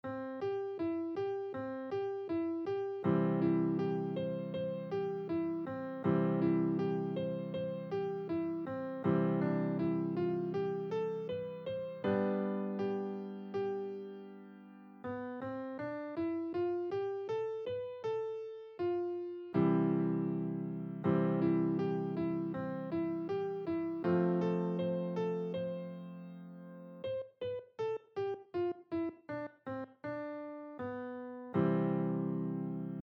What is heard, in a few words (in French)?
Des Gammes et des Arpèges Piano Débutant 80bpm; Les Aristochats